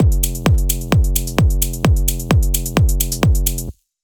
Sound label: Hats and Kick